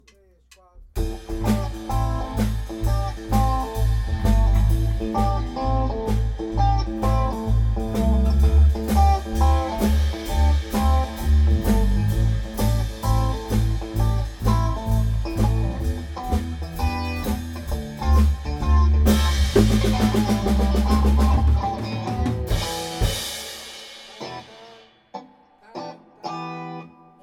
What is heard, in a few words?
Blues